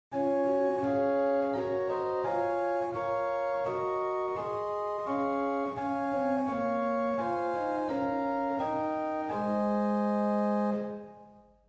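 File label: Spitzflöte 4, organ; Silbermann middle-sized church organ; St. Anne; Reinhardtsgrimma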